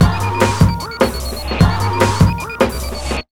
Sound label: 150, Big Beat Drumloops